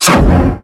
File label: iron; Pokemon Solar Light & Lunar Dark 1.0.1